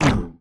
hurt; Gish demo

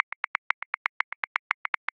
Perc Cabasa Accent 16ths; Grooves; Percussion; Ableton Live 12 Lite; Core Library

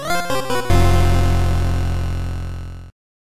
gameover; Super Smash Land

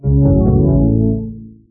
drink coffee; There; ThereClient

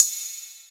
Ride; Drums; Ableton Live 12 Lite Core Library

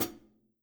Hihat Foot Hard

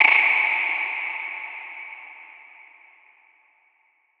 Textures Vocal U; Impulse Responses; Ableton Live 12 Lite; Hybrid

